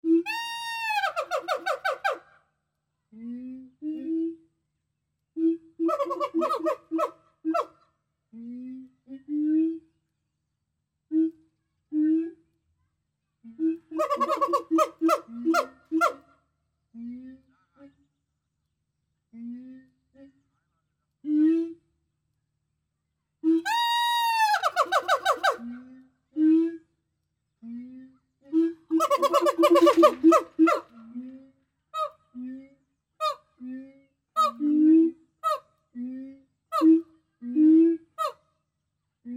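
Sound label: gibbons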